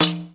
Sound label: TAMBOURI.HIT; Best Service; World Colours